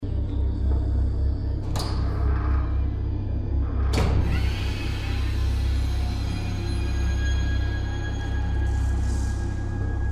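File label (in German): psychiatrie; Neverending Nightmares; gamesoundopedia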